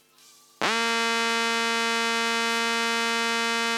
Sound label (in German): rasierer, elektrosound